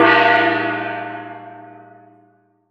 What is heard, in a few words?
chinese gong; AKAI S6000 CD-ROM - Volume 5